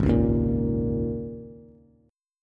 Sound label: Ab Suspended 2nd Suspended 4th chord, Bass; 4,4,1,3; strummed; JGuitar